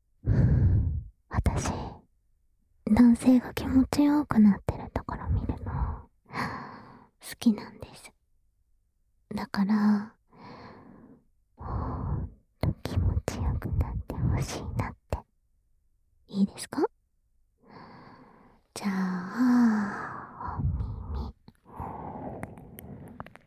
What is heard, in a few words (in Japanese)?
両耳舐め; オンライン舐め活; 両耳舐めでおっきおっき♪編